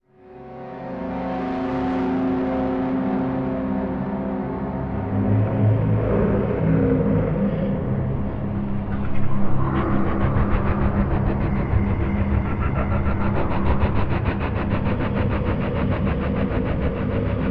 no percussion

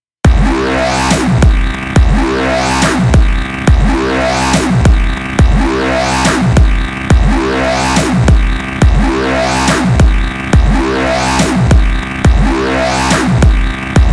bang dubstep vocal mix

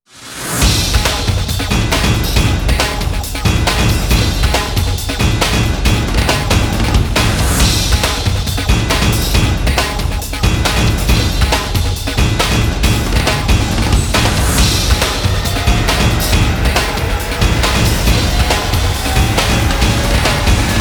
heavy duty drums

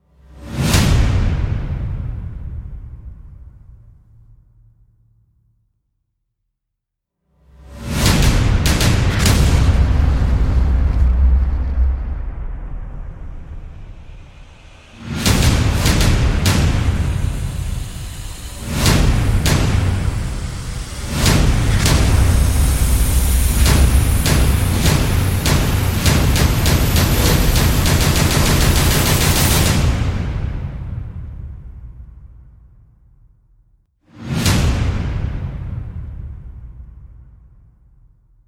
trailer backends absolute extinction perc

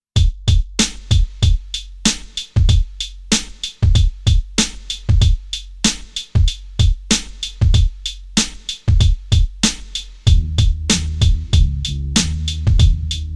instrumental

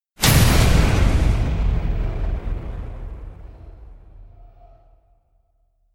destruction slams explosion

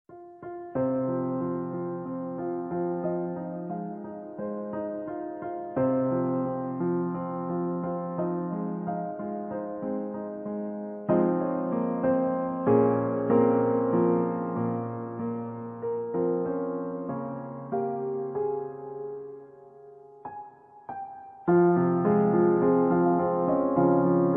piano cover

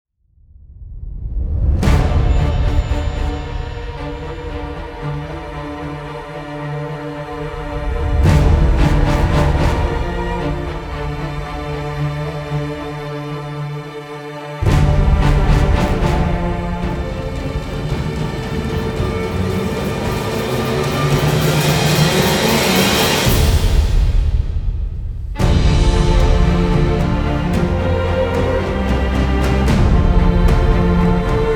no choir

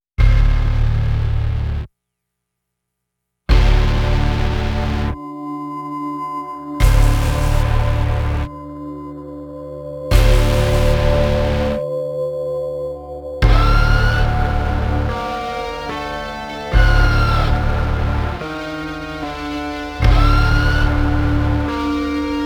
no choir

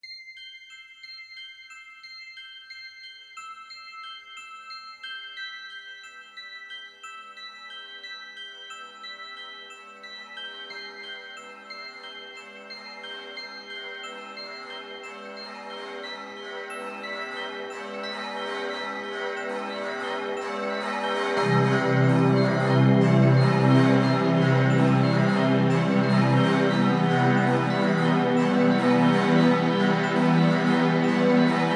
christmas bells jingle bells christmas chillout

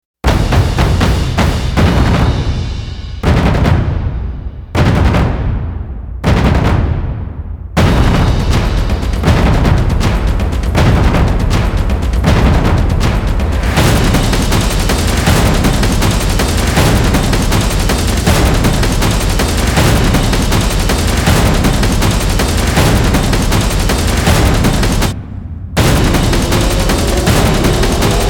drums

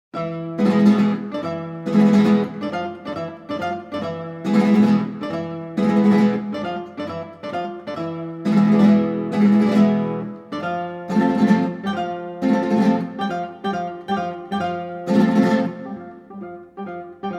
guitar quartet

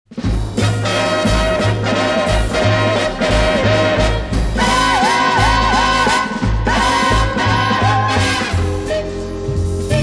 waltz instrumental

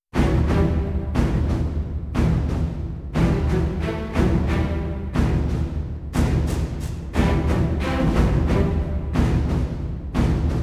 dominion non choir

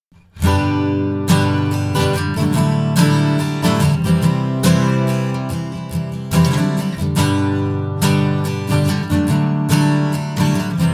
acoustic

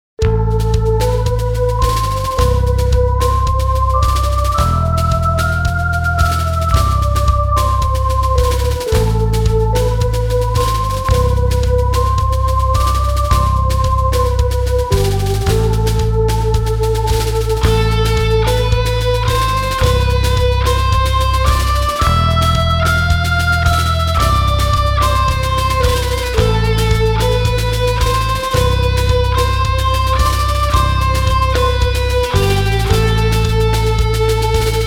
instrumental

